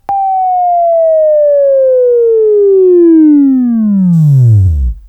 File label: WAV Right, Sweeping; Sweeping Training program